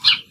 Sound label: single bird chirp